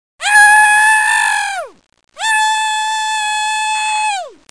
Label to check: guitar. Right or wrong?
Wrong